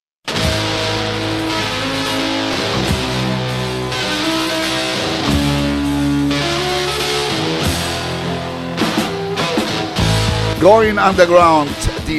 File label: Jingle; Jever